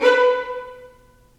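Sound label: violins marcato, String Sections; Roland LCDP13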